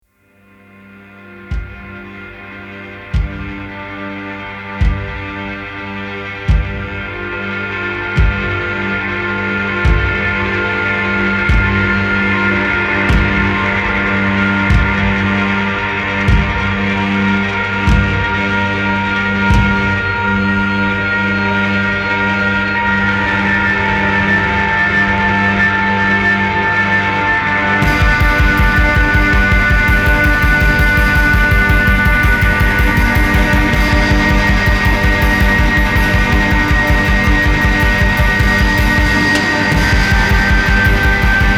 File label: Ambient